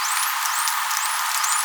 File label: Whistle